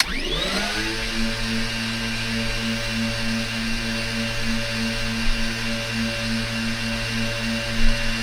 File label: Aircrafts FW-190D9 Transformer; DCS